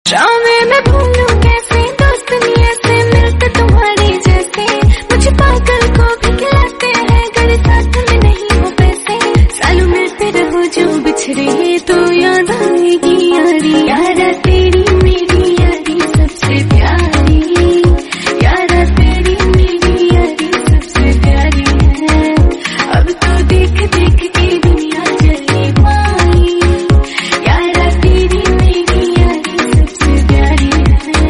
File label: female version